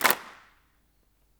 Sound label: GROUP CLAP, HANDCLAPS; Spectrasonics; Heart of Africa